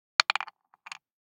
DICE on Wooden Tray, Throw and Roll, Standard, 1 One Die; Samples; ArticulatedSounds; DICE Sfx Party (Extended)